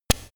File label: short whoosh